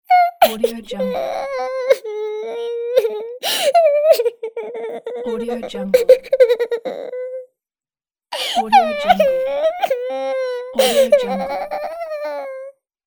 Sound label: cartoon cry; sound-design